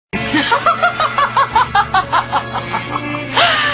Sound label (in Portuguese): risada; paola bracho